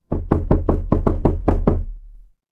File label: door knock